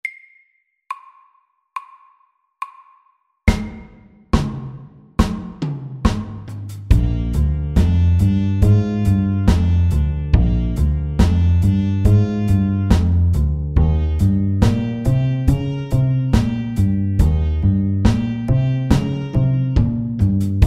Walking bass SLOW 70

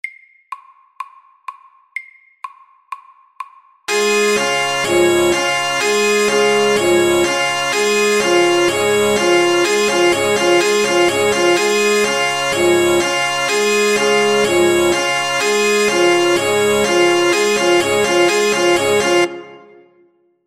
Alarm MIDDELS

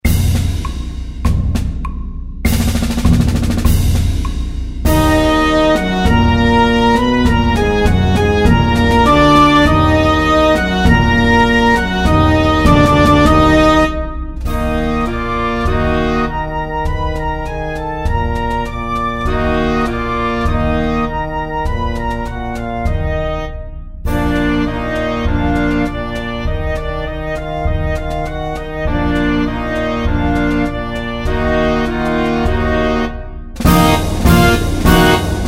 March in C minor

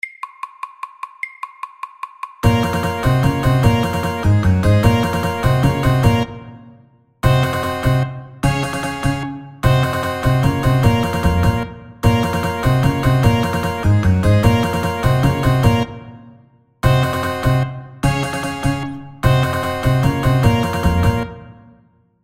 Spanish pepper FORT

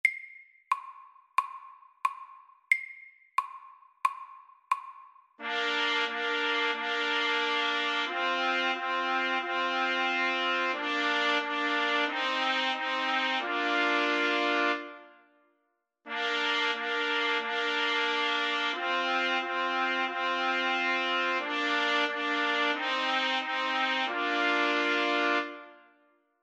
First fanfare SLOW 90

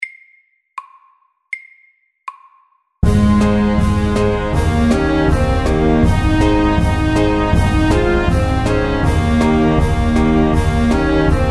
Cancan, 80

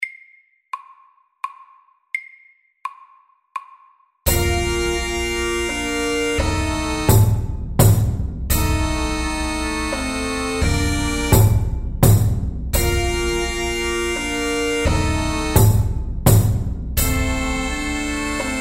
Stomp MEDIUM

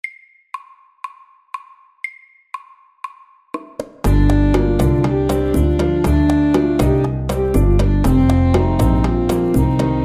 Driving saxes FORT

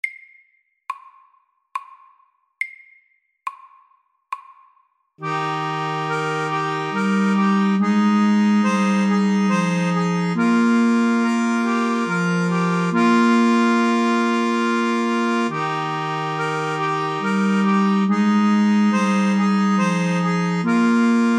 Musette SLOW

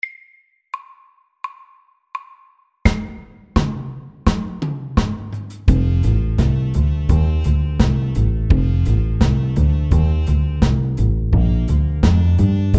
Walking bass MIDDELS

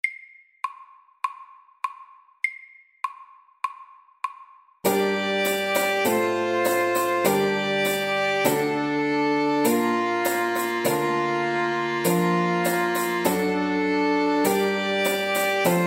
Old English dance MEDIUM 100

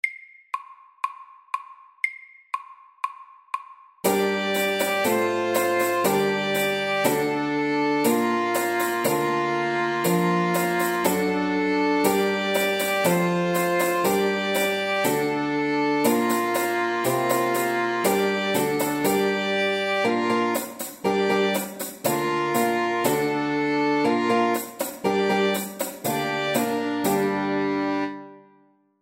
Old English dance FAST

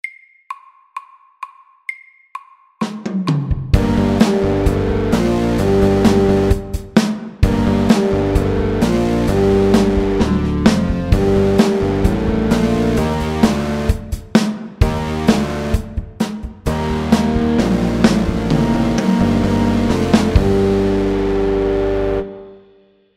Metal rock FORT

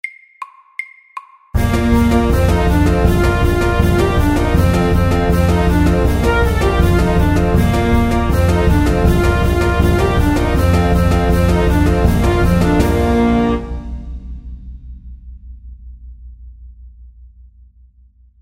Cancan FAST 160

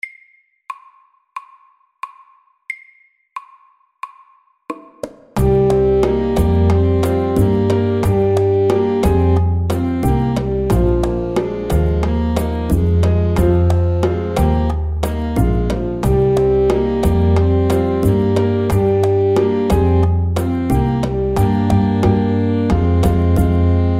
Driving saxes SLOW 90

